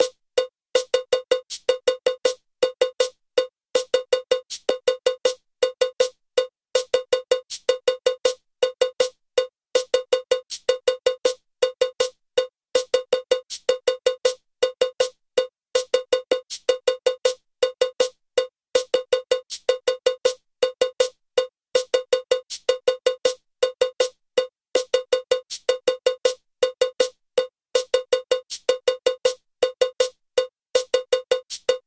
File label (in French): mambo cloche lent